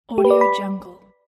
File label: bonus